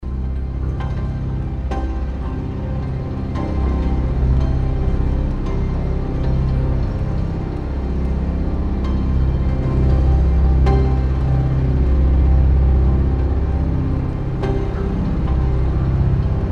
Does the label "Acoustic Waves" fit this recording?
No